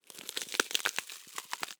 Cardboard, honeycomb, crush, tear, pop, short; Eiravaein Works; Vaeyan IV

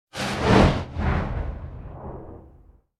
Thunder Whoosh - Higher; Hollywood Edge; sound designer's toolkit v2

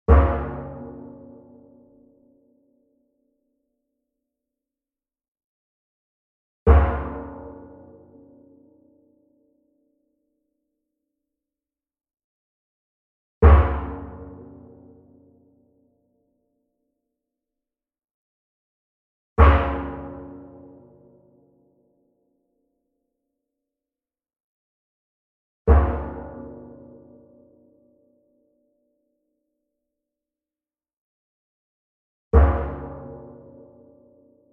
Sweetener Metal Pan Hit Soft Beater Medium; boom library; Cinematic Strikes Construction Kit

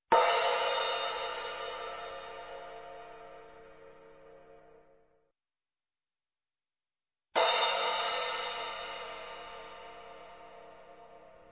Cymbal medium Size Crash, Music, Percussion; Sound Ideas; Series 2000

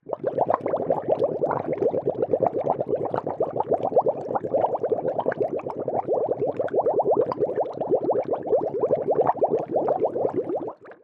Bubbling Brew Liquid Multi Bubble; Hollywood Edge; sound designer's toolkit v2

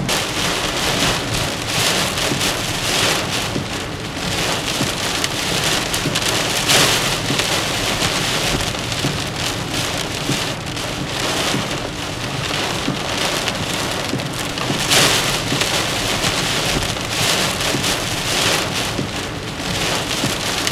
car rain interior; best service Blue Box

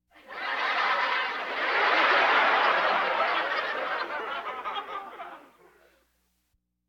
Laugh tracks

